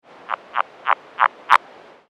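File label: Rana aurora underwater short, frogs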